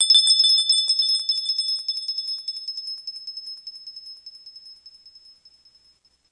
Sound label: tinkle bright bell; Bells